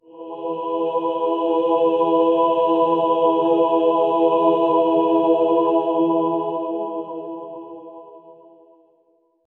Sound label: sacred choir